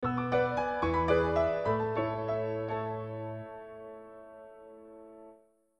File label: Nokia tune